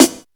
crispy acoustic snare c key, Royality free snare drum sound tuned to the C note